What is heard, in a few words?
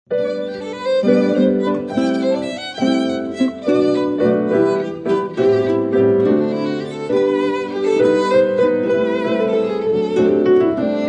Strings